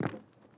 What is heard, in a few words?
Step